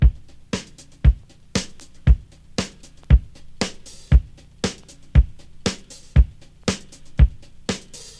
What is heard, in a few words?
101 bpm high quality breakbeat A sharp key, kick tuned to the A# note; drum loops